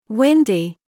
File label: windy